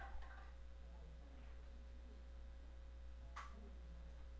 spin

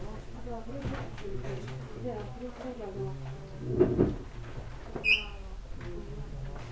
erupt

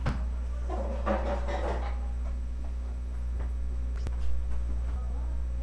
plastic

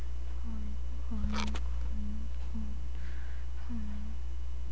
coin

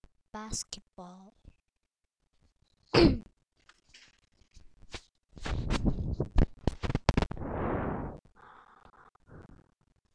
basketball

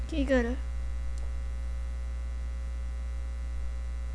giggle

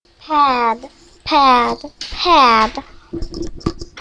pad

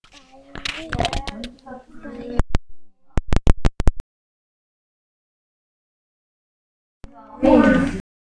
violin

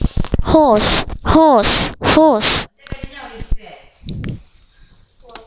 hose

